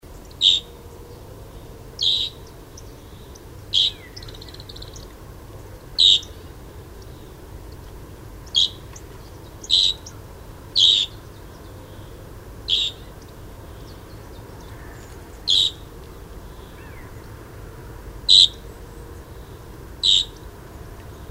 Raubwuerger; Hoehenberg STA; Susanne Rieck

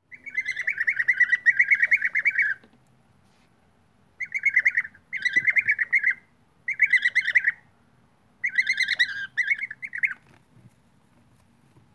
Bienenfresser; Freising FS; Elsa Loessner

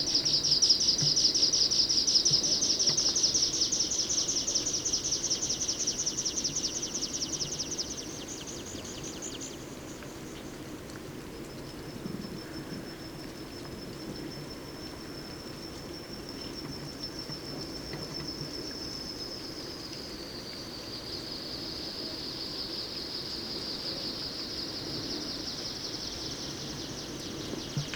Bergpieper; Alpspitze Grainau; Michle Peron

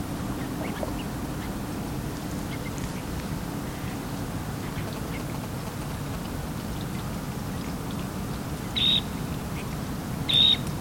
Alpenstrandlaeufer; Mohrweihergebiet ERH; Barbara Goldmann

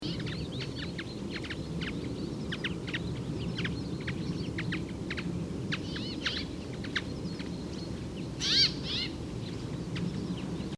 Bergfink; Kaeufelkofen LA; Michle Peron

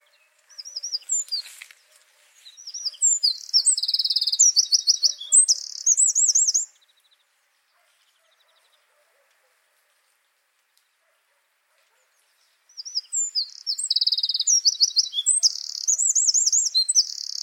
Zaunkoenig; Tiefenbach OA; Kilian Weixler

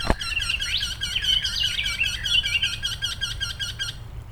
Wendehals, Murnauer Moos; Christian Haass

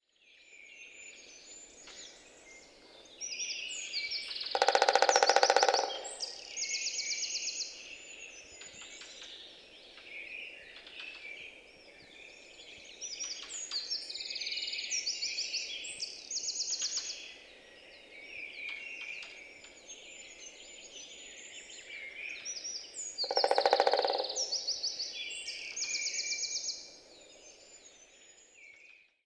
Dreizehenspecht; Gschwender Horn OA; Johannes Honold

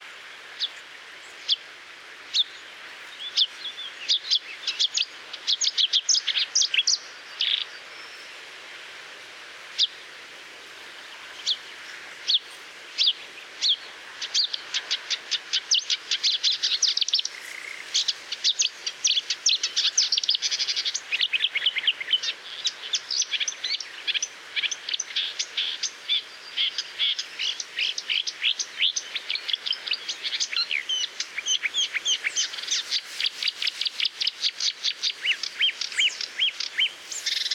Sumpfrohrsaenger, Moos; Moos DEG; Michle Peron

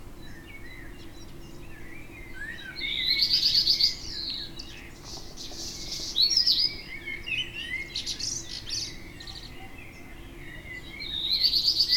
Hausrotschwanz; Spiegelau Bayerischer Wald; Michle Peron

